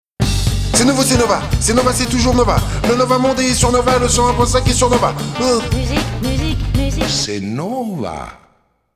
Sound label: Radio Nova Jingle; Nova 25 ans - 1994